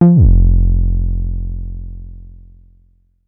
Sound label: Synth one shot Glide Down F, Bass; Synth Bass One Shots; Slate Digital BASSHOLE Sample Pack